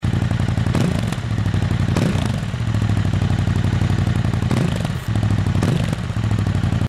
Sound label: adventure 950, ktm